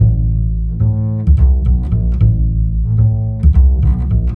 bass, Loops

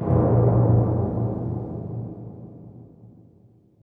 gong

